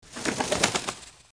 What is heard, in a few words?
Flaps